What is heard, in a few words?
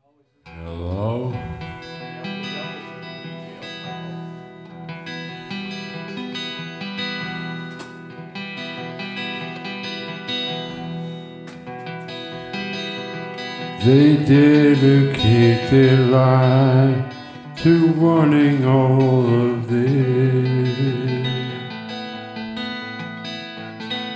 Jam; r1 studio sessions